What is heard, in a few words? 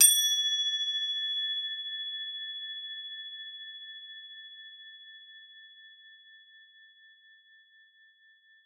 CRO A4, CROTALES; Xsample VOL-4